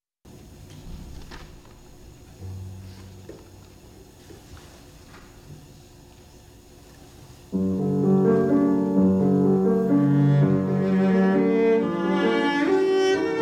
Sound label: Sonata for Cello and Piano